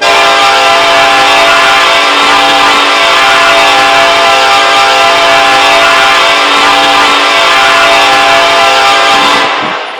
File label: k5lla, horn; run 8 v3 horn pack; bnsf